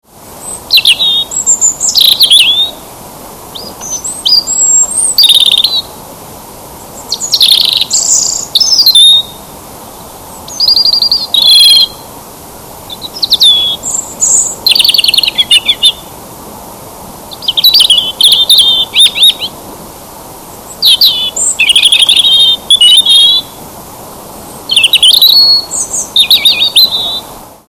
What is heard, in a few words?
robin